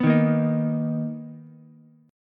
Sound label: B Major 7th B down Ukulele; strummed; JGuitar